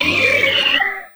Leviathan Claw

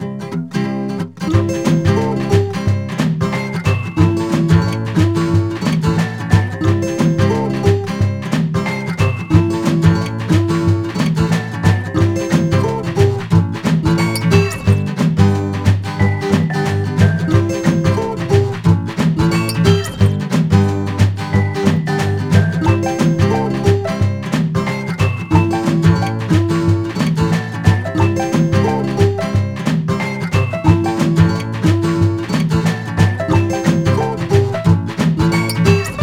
BGM Menu Solo; lucky luke the daltons; nds

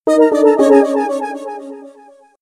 Jingle You Lose; rayman 2 remastered soundtrack

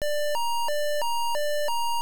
Sirene; msx; frogger

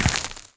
warrior walk stone

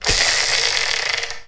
cowboy spin

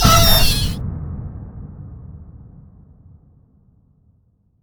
Death Moth Jumpscare; gamerip; Backrooms 2D

